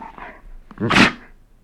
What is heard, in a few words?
wolf pissed; The Elder Scrolls III - Morrowind; were